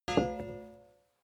hammer drop soft

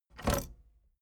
tape deck bounce

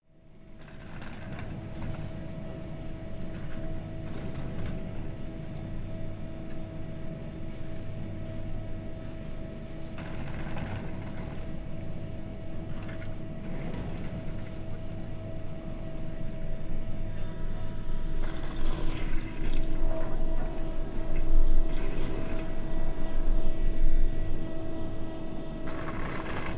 ski resort lift close

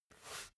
basketball turn swipe short quick

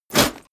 metal plate armor movement fast

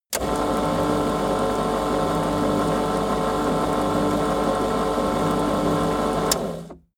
shredder manual

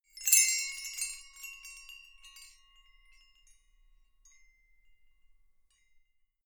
windbells ringing

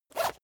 zipper use short fast

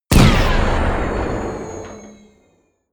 sci-fi plasma cannon explode

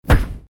shot

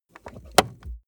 plastic plate crevice click

